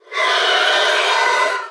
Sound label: sapper scream